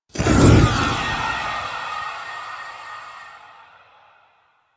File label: Purgatory Ghost Spawn; Warframe